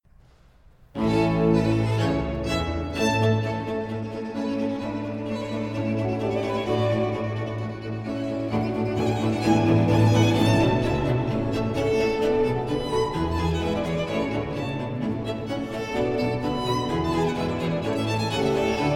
B minor major for 2 violins and basso continuo Allegro